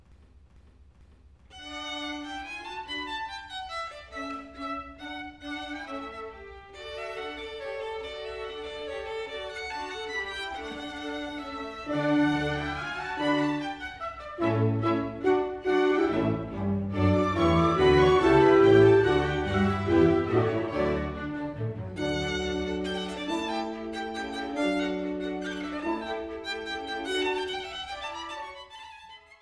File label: Violin Con D